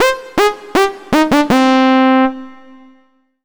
Solo Clean Sawtooth Negative; startide

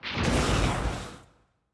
Hit Rock Throw; nari kids park ultraman r b switch gamerip 2018

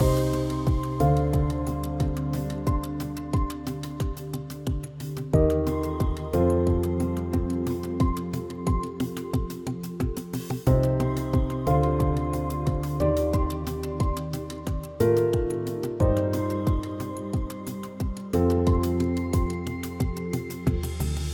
Mood Normal Medium

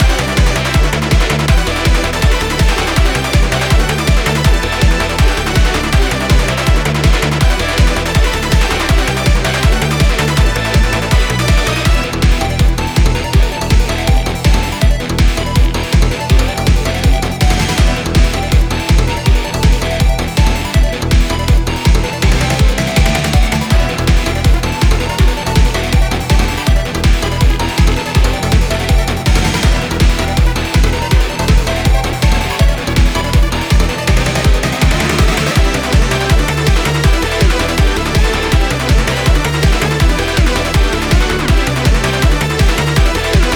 boss loop crash

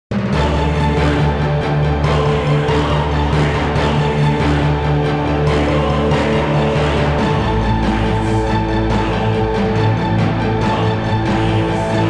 Tension; front mission 1

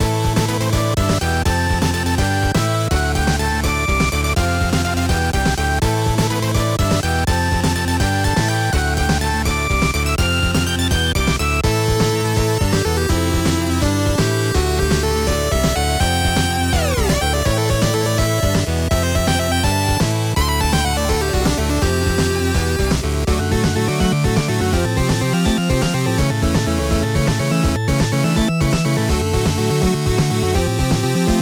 Boss Fights Loop; bat boy; ps4 ps5 switch windows xbox one xbox series xs

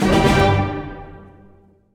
Gtr 16th Alt

